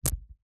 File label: industrial metal ball bearings multiple down on soft surface